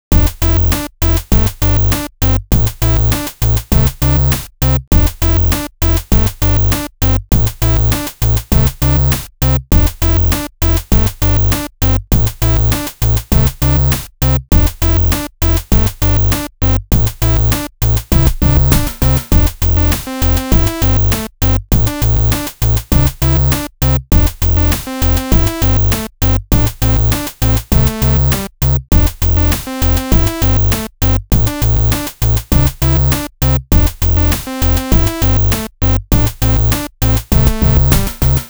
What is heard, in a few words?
analog beats, chiptune music; looped